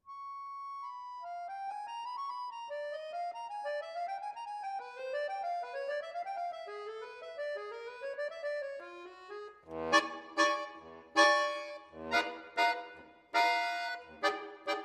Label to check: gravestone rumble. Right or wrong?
Wrong